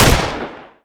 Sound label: shotgun fire